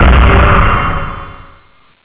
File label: fire mage fire jump; Warcraft 3